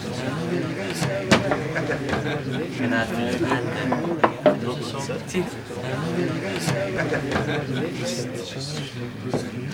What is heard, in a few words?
Inn Loop; kult